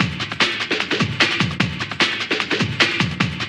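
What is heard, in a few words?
drum loop; X-Static Goldmine 3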